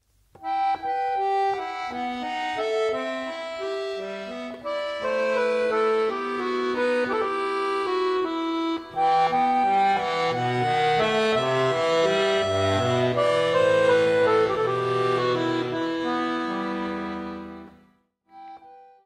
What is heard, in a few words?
accordeon solo gigue en rondeau; rameau